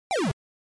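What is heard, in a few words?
Game Boy Action Damage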